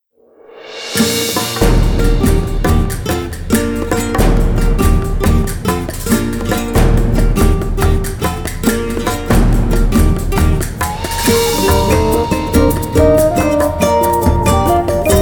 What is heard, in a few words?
Spanish Travel Music; Pirates of the Burning Sea